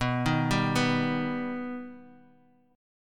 B Augmented B, chord; arpeggiated; JGuitar

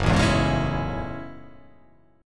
F sharp Minor 9th F sharp

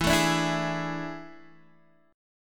A Major 7th Suspended 4th E

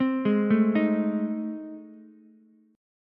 A Minor Major 7th Flat 5th A, Ukulele; arpeggiated; AmM7b5; JGuitar

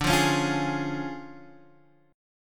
D Minor Major 7th Flat 5th, D, Guitar Standard; x,5,3,6,6,4; DmM7b5 strummed; JGuitar

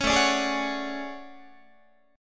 A Major 13th Guitar; AM13; strummed; JGuitar

